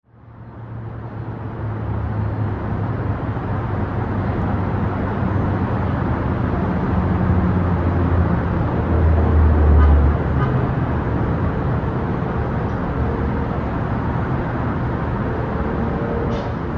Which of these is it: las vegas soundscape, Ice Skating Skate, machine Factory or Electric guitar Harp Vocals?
las vegas soundscape